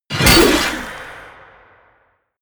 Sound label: sickle hit whoosh bloody